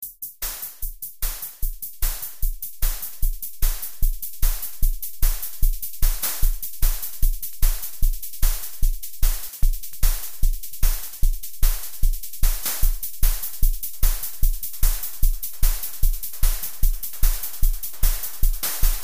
vhdl drum set

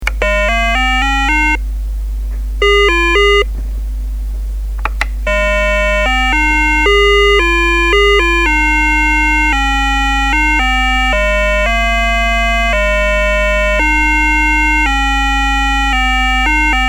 soundcheck

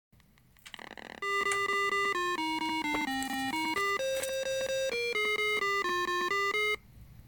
game over

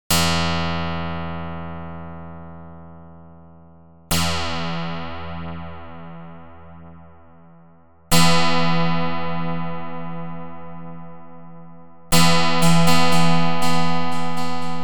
noise double ram feedback sound generation stereo

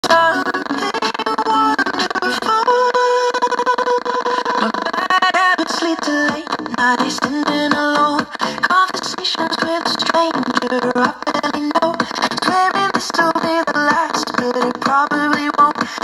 Grundig

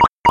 car sound square filtered

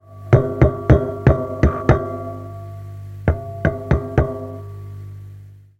ECC83

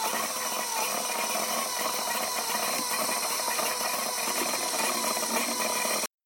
audio motor

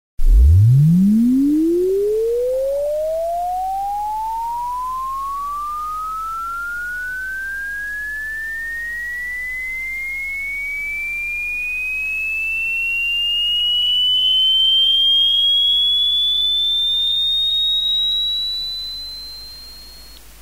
3400Hz Filter; analogen Telefon (Fe TAp 791-1)

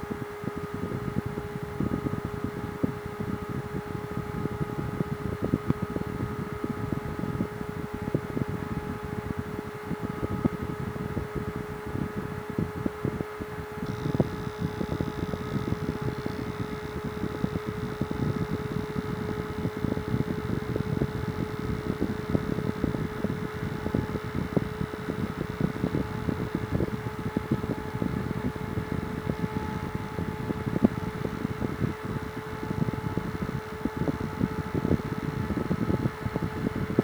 Noiseblocker XS-2 innen

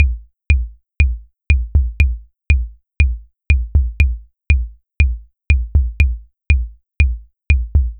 808 kick short clave

